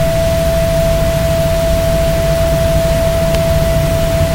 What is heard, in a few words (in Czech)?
waldflote, englerovy varhany